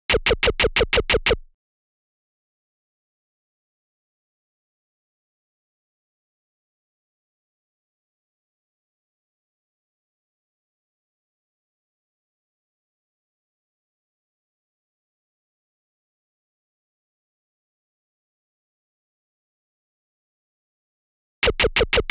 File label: harsh drums